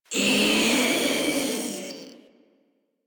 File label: Proto Infested Ancient Death, Enemies Vocals; Warframe